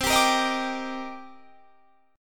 Eb Major 7th C; Ebmaj7/C; strummed

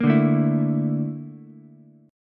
A sharp Major Sharp 11th, Ukulele, C6; A#M#11; strummed; JGuitar

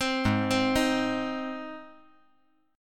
G sharp Major G sharp, chord

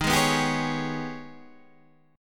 G sharp Minor Major 11th D sharp

